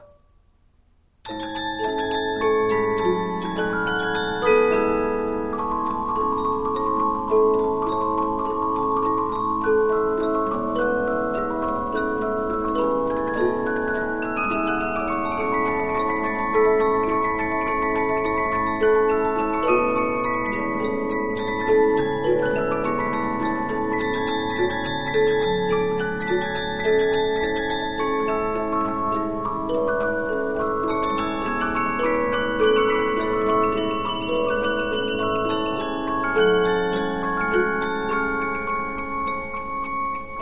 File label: Polyphon, 15inch disc musicbox